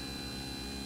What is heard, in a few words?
Turret Loop; Barotrauma; EK modern